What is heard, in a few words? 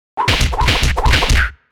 Flying combat anime fight